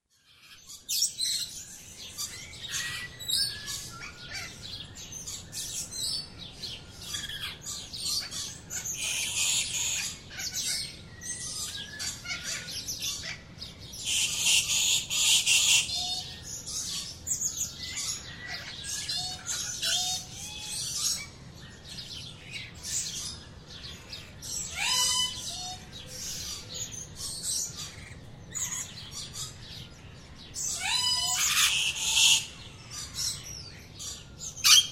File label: Dawn South America Jungle, Birds in the Rainforest; naturescapes